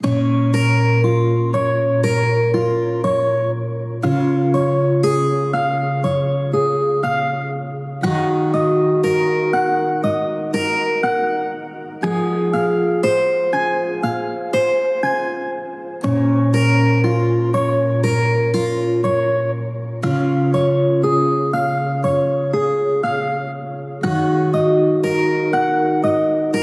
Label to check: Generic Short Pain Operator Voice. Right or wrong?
Wrong